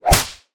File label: whip